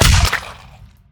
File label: impact, violence; Benny Placeholders